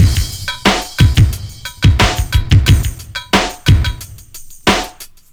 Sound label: big break, 90bpm